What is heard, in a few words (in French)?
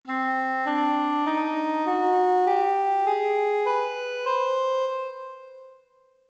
nawa atar, La gamme nawa atar; gammes dérivées de la gamme rast où on utilise toujours la tonique DO